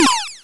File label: Pew, Retro Game Weapons Sound Effects